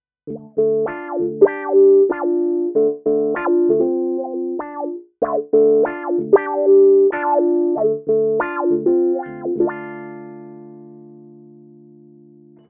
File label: Korg SV 2 Wah